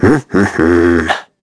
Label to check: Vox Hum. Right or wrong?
Right